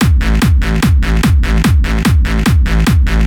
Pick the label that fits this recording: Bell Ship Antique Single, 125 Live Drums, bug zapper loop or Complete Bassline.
Complete Bassline